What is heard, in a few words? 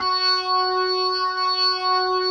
F#4S, B3, Vintage Keyboards